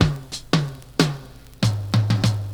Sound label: FILLS, 70_s Breakbeats